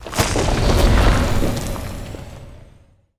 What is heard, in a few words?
lithoid moving out cruiser; Stellaris; lithoids